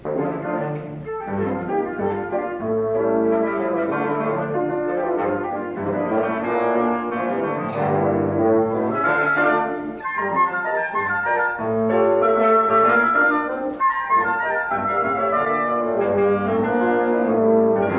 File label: Chamber Music